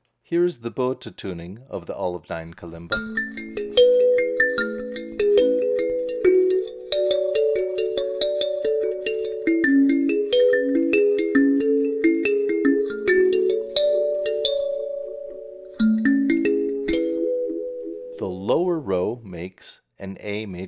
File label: Olive Tuning, kalimba; Olive-9 Kalimba in “A” Mystic Tuning; Magadi Olive-9 Kalimba; The mystic tuning is left based